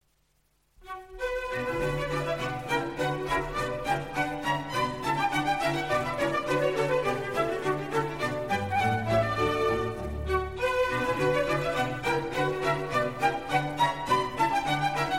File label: Orchestral Suite No. 2 in B Minor, BWV 1067 _ 4. Bourrée I-II